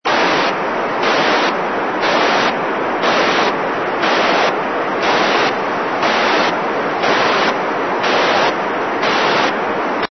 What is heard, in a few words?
RS41 burst